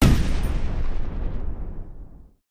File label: security bot weapon fire stop; gow; fortifications